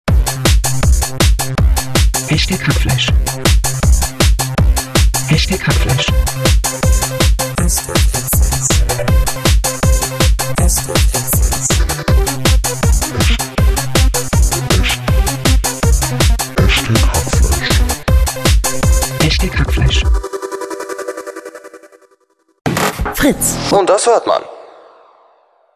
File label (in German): hackfleisch techno